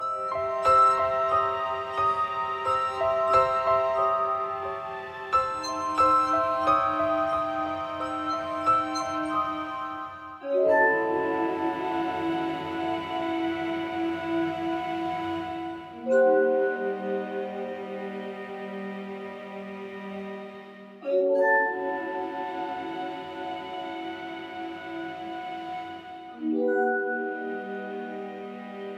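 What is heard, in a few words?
music ocean abyss suspense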